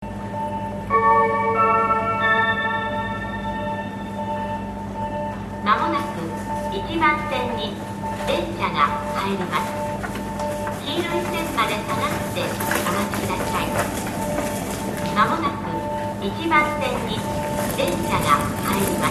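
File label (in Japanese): yamagata sekkin, 接近放送; １番のりば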